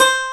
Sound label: banjo; cubic